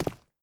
Cobblestone, Footstep

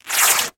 Cloth Rip; Epidemic Sound; ROBLOX GAME ASSETS; Gameplay

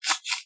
Hand Soap; Sex Sounds; ero sfx

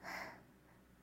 light breathing; ROBLOX GAME ASSETS

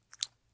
lip sound; SEXUAL sounds; ROBLOX GAME ASSETS

